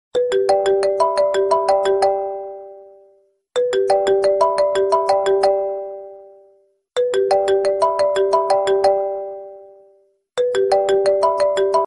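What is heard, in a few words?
samsung galaxy ringtone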